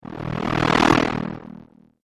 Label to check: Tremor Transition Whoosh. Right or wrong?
Right